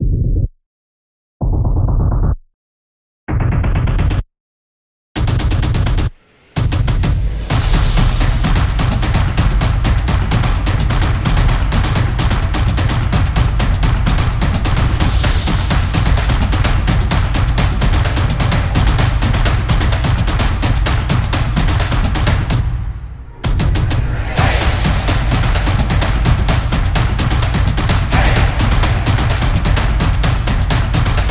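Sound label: The Football Music, Football percussion background music inspired by samba rhythm, stadium energy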